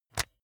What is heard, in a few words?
jigsaw button on; soundpool